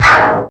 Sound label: NOISE; DRUMS & PERC